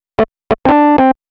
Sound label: Rhodes Solo, Cinematic Funk